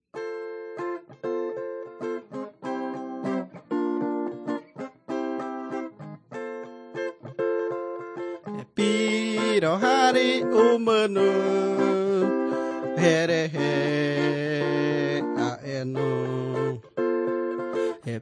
singing to god; guajajara; wycliffe bible translators inc nt drama

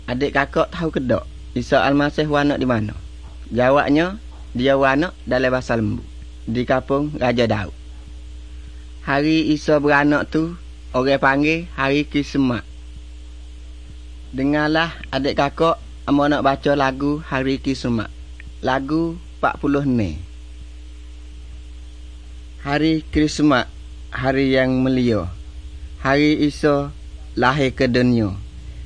songs malay pattani

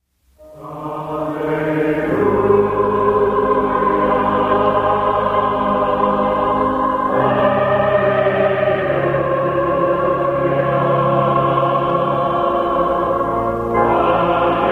allelujah allelujah; hymns

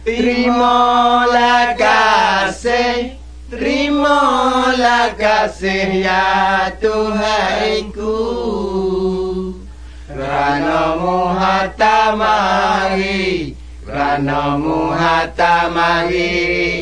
songs malay pattani

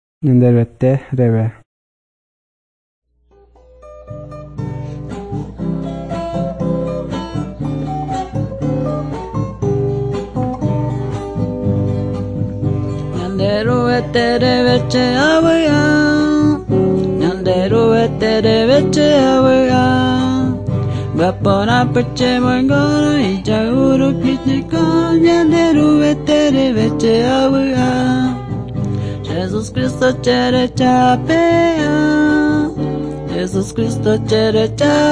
songs guarani mbya; bible

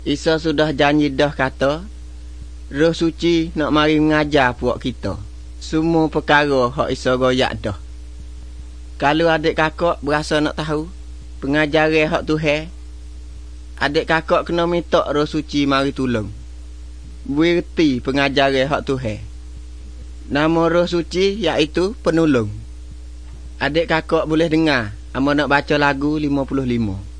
songs malay pattani

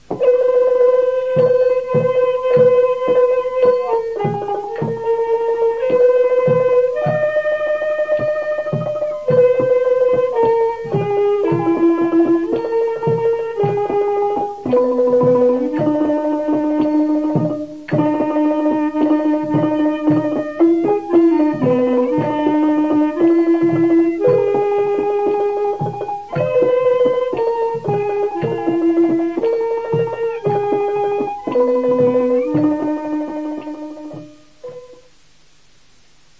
songs hmong white

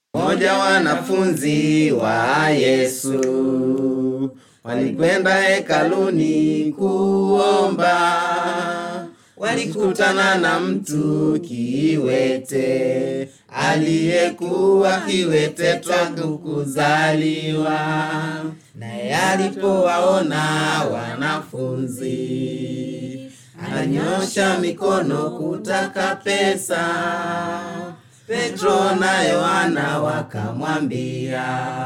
song jumping and praising god swahili; swahili tanzania; bible stories

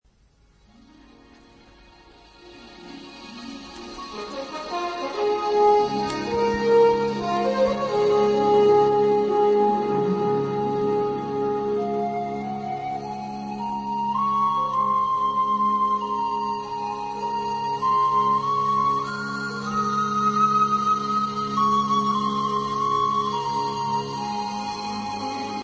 mathio music; vietnamese bible nt

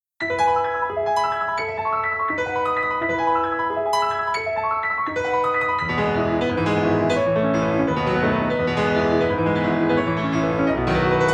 piano

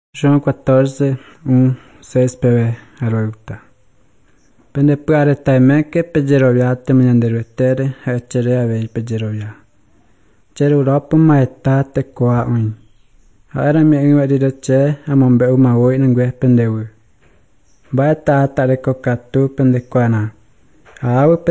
songs guarani mbya; bible

